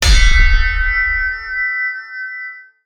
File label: command block clang